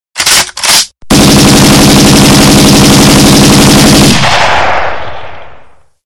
baller sounds fuer handy ak 56

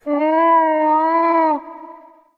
blauwal, klingeltoene

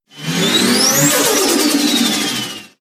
turbolader turbo blow off sound

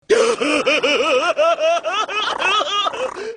patrick star lachen

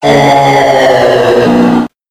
zonk sound melodie; ohne klatsch; zonk klingelton; geh aufs ganze

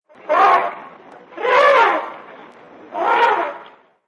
tierstimmen afrikanischer elefant; sms toene

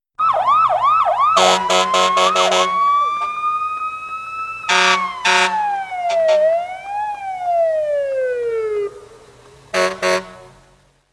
klingelton feuerwehr martinshorn sirene

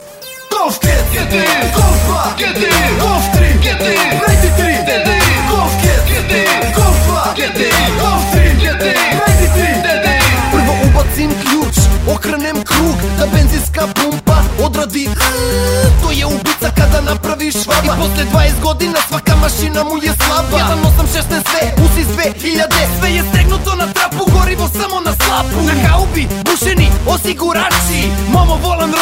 golf gti rap

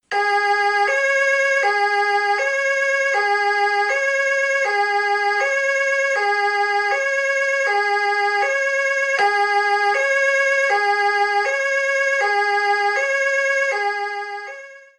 sirene klingelton polizei feuerwehr and krankenwagen; sirene deutsc

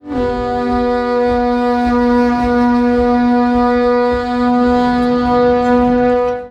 horn sound; herr der ringe; mordor s horn; horn von mordor

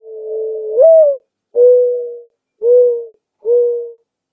taube gurren sound

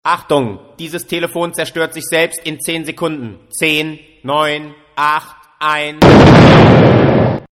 explodirendes handy, fun ringtones

